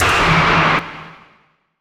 NOIS; XXL 800 Production Effects

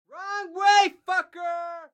car reaction; Blind Drive

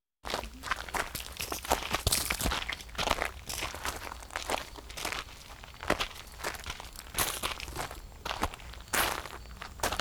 Walking Machinery Trucks

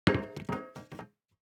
DICE on Metal, Throw and Roll, Foam, 1 One Die; Samples; ArticulatedSounds; DICE Sfx Party (Extended)

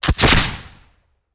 Musket; Sound Ideas; Guns

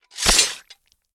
GORE Blade Chop; mono; GORE & SPLATS; Universal Sound FX

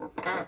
Creak Metal Sign Squeak; SFX Kit

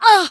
female pain; firefight

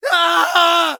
npc male scream first; DeadFright

